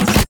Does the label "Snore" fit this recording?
No